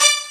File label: String Hit D4; Proteus 2000; Multisamples; Digital Sound Factory Proteus 2000